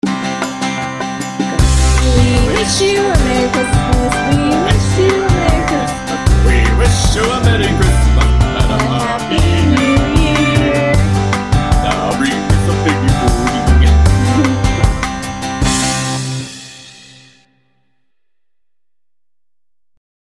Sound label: christmas song